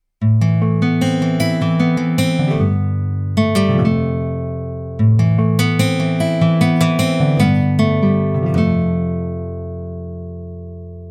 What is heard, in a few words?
Gypsy Guitar